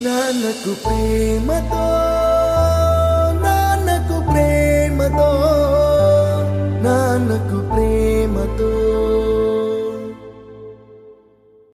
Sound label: title song ringtone